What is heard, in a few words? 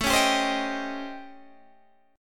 A 7th Sharp 9th Flat 5th, Guitar, DADGAD; 19,16,17,17,18,17